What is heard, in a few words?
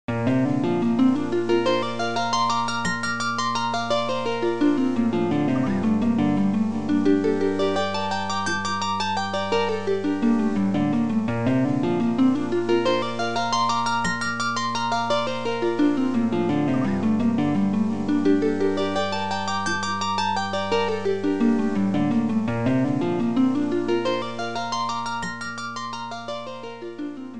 lute